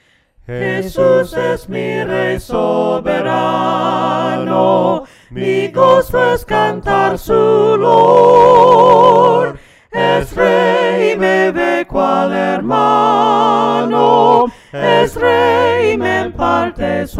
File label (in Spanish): VOCES